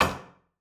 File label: release button; Opus Magnum